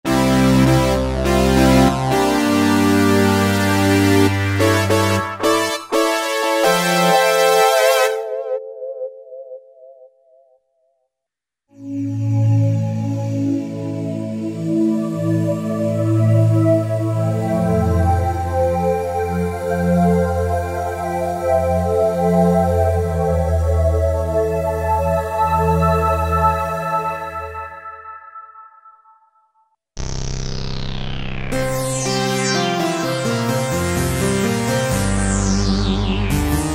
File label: SYN JD 800 990; Best Service Gigapack